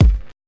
old school kick drum g sharp key, kickdrum sound tuned to the G# note; kick drum samples